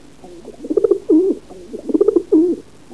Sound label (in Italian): Piccione selvatico, Uccelli; Suoni